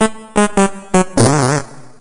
Jingle Bonus Level You Lose; rayman 2

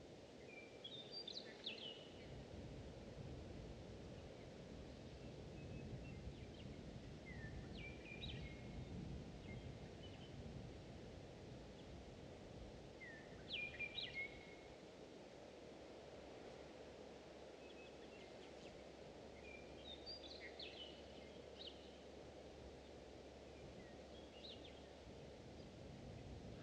Forest Woodland Loop; golf story switch gamerip 2017

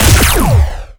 Ectoball Hit; Nicktoons Nitro; arcade

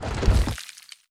false knight jump; gamerip; Hollow Knight

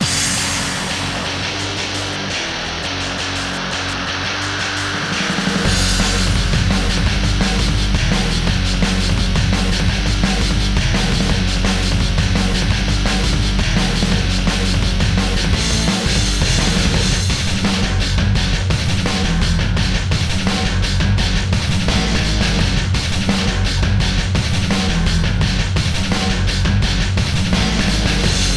battle; Shin Megami Tensei Imagine gamerip

